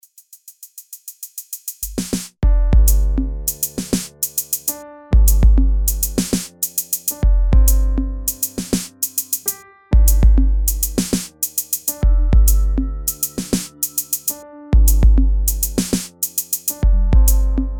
Zombie Garden

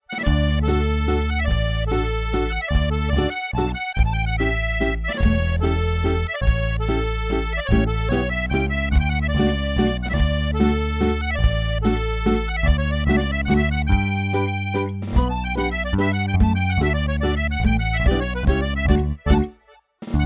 Mazurka Short Loop; Benvenuta Estate

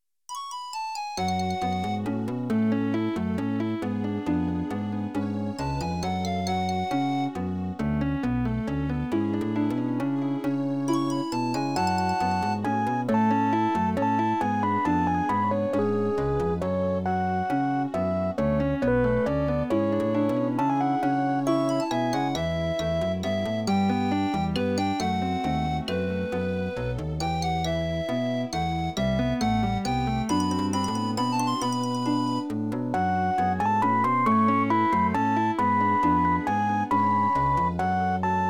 Spirit Waltz

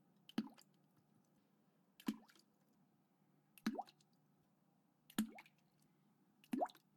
water drops

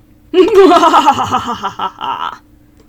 evil laugh

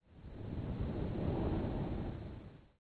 wind

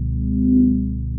ambient techno

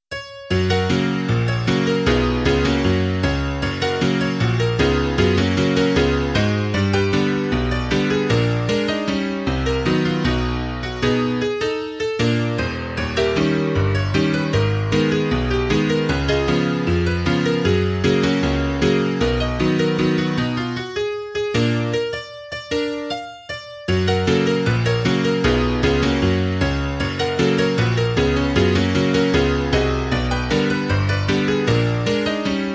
confusement rag

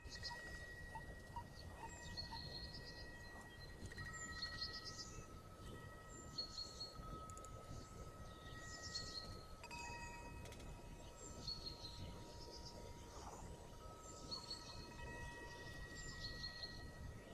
Forest Ambience

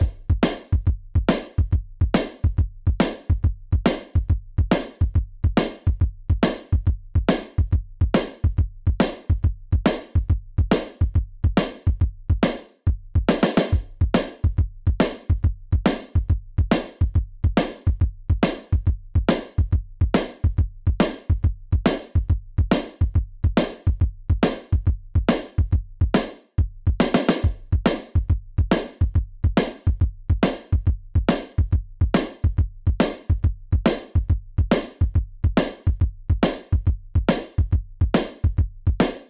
drums only

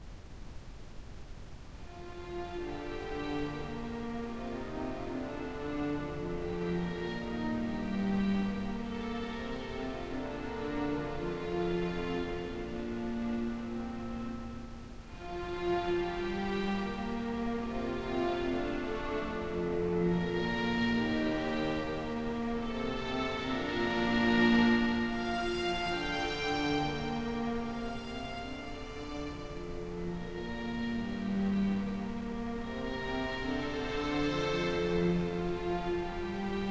music

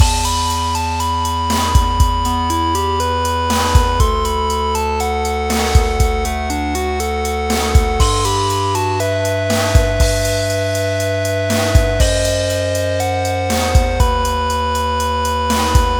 Dungeon King Loop

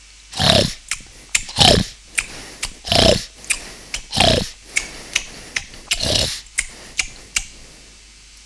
animal eating breathing

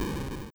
boss Damage; Die for the Empire

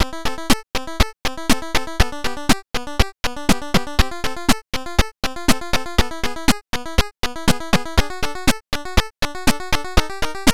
Jumping Bat NES

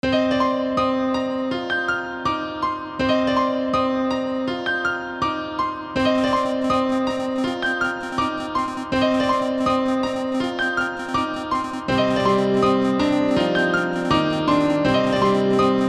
piano; Andys Report

